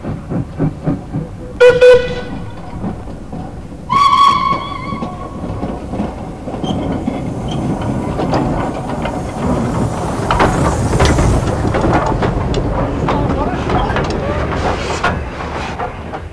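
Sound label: steam train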